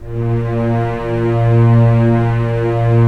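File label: STR SC, strings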